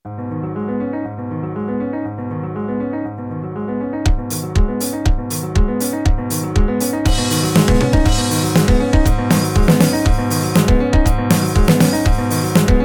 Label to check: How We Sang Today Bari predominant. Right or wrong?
Wrong